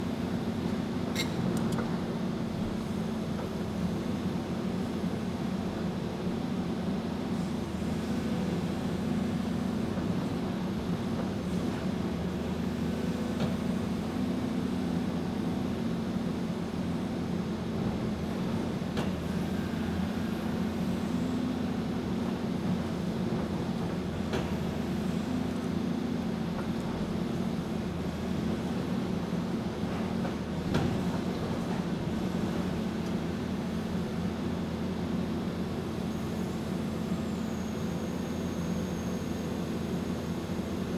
Industrial Digger Street Construction Beeping Digging Engine Volvo Digger Penang Malaysia; Zoom